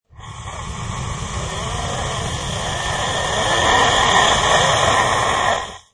Alien Monster Breath, Alien monster or fantasy creature breathing; Monster breath: Alien monster or fantasy creature breathing and grunting, threatening, menacing. Long, whistling, labored